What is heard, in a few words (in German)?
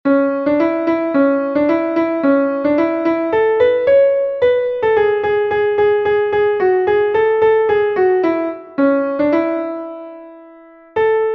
klavier; MelodieMeister